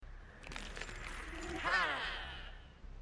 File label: moaning glass wind; dead by daylight; asmr; the spirit; family residence